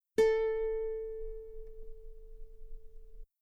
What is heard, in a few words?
guitar A4 very long forte normal; bananenkeyboard